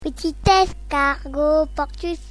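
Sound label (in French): petit escargot, comptines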